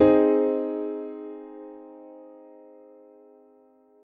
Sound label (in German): c dur uebermaessig